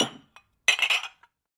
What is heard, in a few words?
Cup on Table Sound